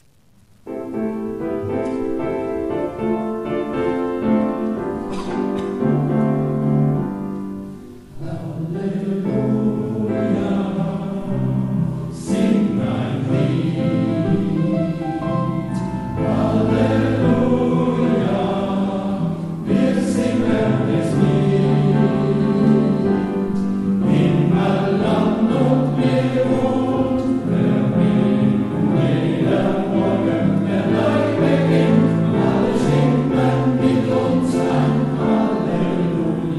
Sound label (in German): Halleluja sing ein Lied, Choraufnahmen; Gesangfest Eschenz; Sängerbund Elgg